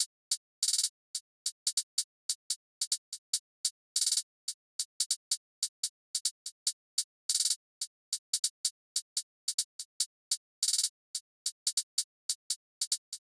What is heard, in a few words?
Drum Loop, 144 BPM Hihat, Drill; Loop Stems; Cymatics; GHOST (Beta Pack); Arrived Drum Loop